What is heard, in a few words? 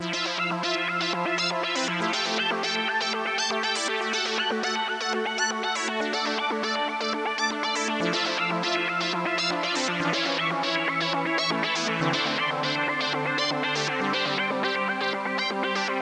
solo